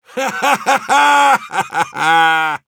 Heavy laugh happy ru; Счастливый смех

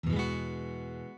Chord Guitar Eadd9

